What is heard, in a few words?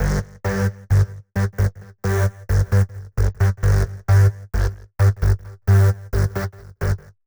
132 House Bass Arp Gmaj; Dance DJ; Digidesign; Pro Tools; Pro Tools Essential Loops